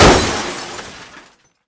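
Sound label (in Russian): острый дребезжащий стеклянный звон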